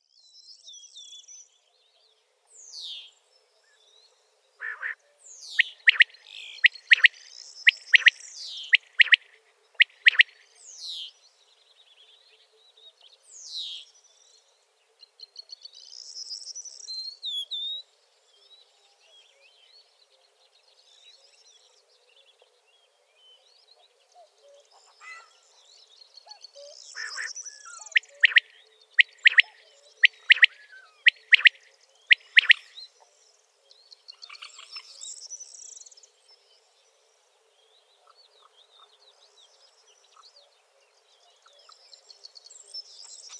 Kwartel; rdewys

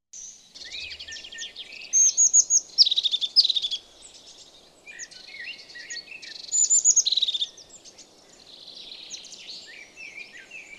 Kuifmees, vogelgeluiden

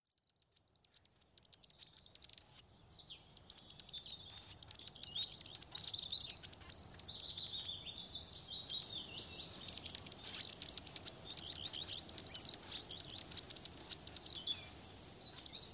Staartmees, vogelgeluiden

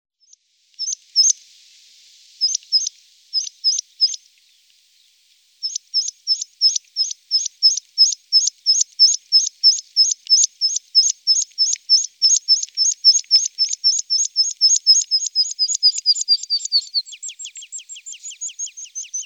oeverpieper, vogelgeluiden; hdykstra

